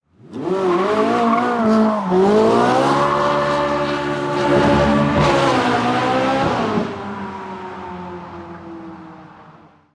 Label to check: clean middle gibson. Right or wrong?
Wrong